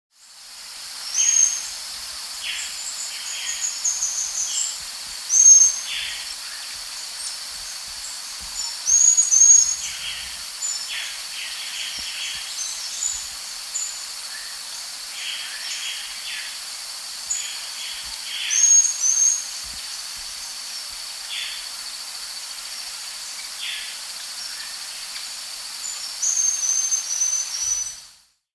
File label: Buff-Banded Tyrannulet (Mecocerculus Hellmayri), Andean Birds; S. America